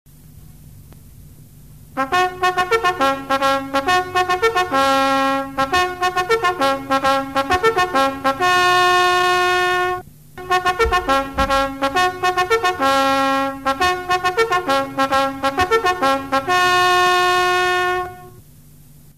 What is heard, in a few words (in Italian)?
adunata; Musiche di caserma